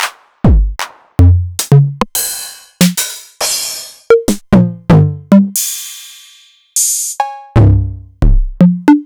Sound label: Novation Drumstation